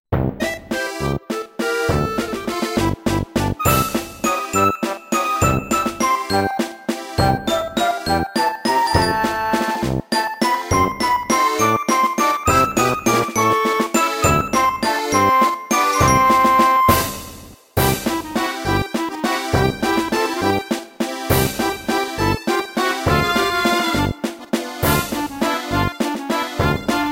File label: sea; w00tguy; botparty